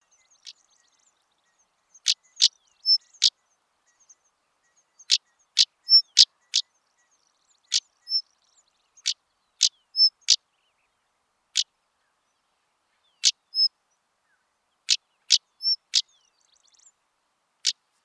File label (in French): tarier pâtre cri